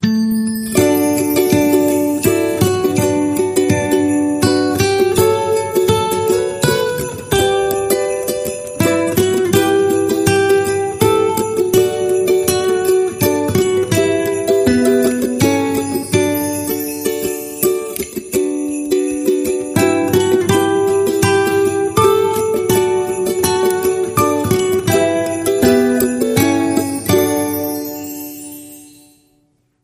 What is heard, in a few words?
Away In a Manger Ukulele Bells